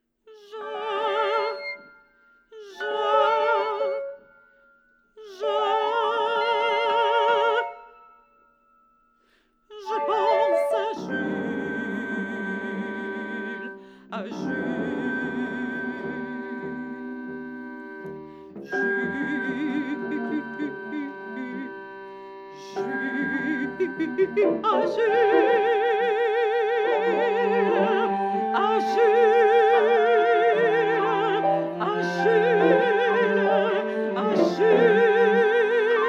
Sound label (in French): le chant